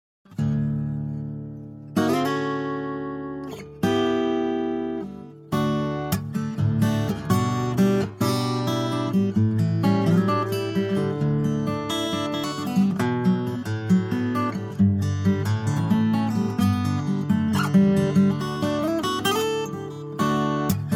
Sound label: Guitar